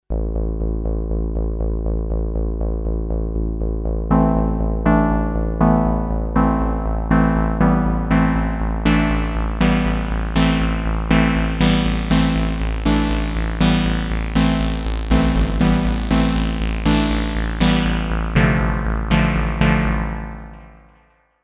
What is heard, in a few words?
Polysynth; Mayer MD900